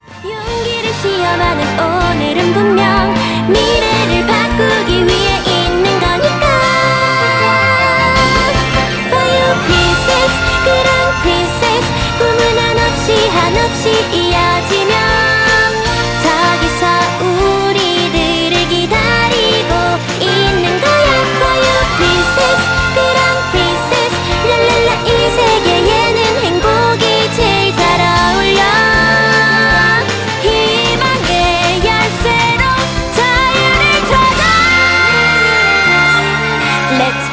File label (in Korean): 공주 느낌의 애니주제가 20대